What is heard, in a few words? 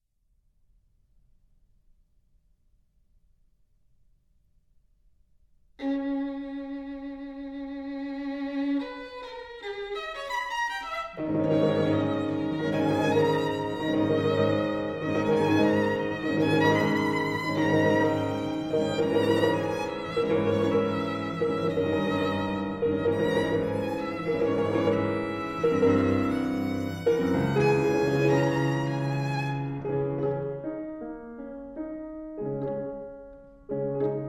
Violin Sonata; Janacek